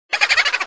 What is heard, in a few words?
boo